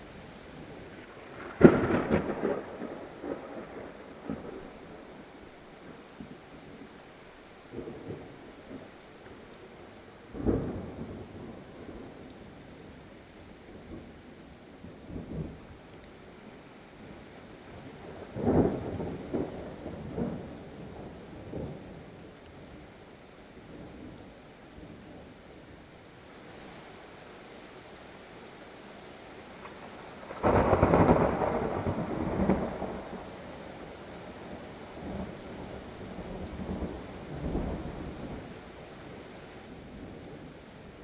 onweer

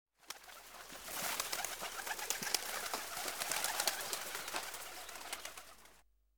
Duiven - Opvliegend; KMP Film Video Sound Effects

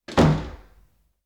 Houten Deur Dicht; KMP Film Video Sound Effects

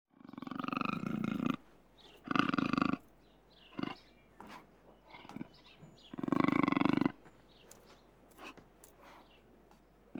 Poema Gegrom; KMP Film Video Sound Effects

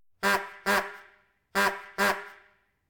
Antieke Claxon Van Auto, verkeer algemeen; KMP Film Video Sound Effects

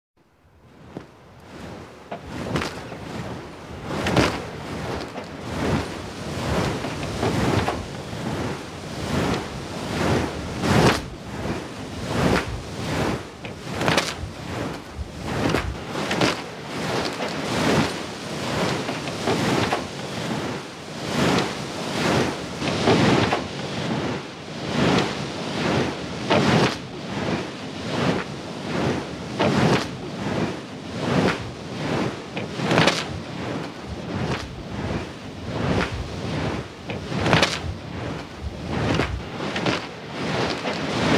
Molenwieken; KMP Film Video Sound Effects

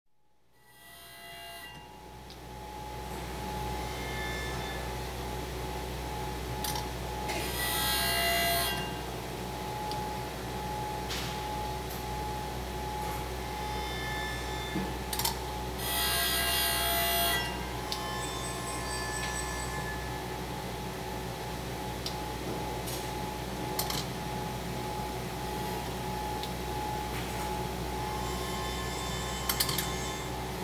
Diamantslijpen; KMP Film Video Sound Effects

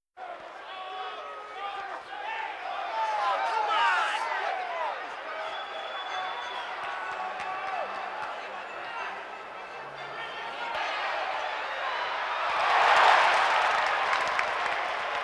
Hockey Algemene Sfeer; KMP Film Video Sound Effects

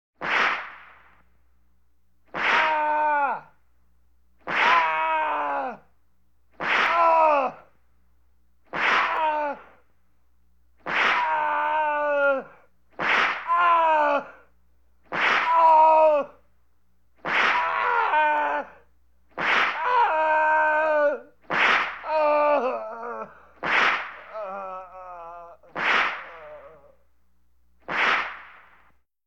Man Krijgt Zweepslagen; horror effects; KMP Film Video Sound Effects

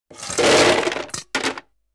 Ijs In Ijsemmer; KMP Film Video Sound Effects

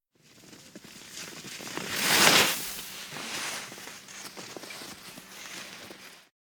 Skieer Stopt; KMP Film Video Sound Effects

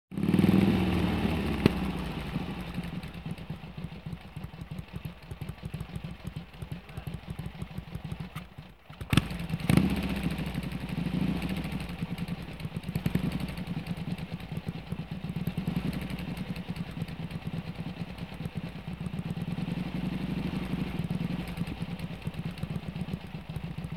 Motorfiets Stationair; KMP Film Video Sound Effects

